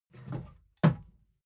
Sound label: wood board handle roomy; objekte; holz